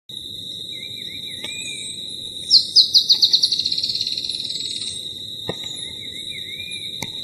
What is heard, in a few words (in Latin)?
Drymophila malura; PP Mocona